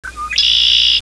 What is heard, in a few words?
Bird; 特酷声音素材库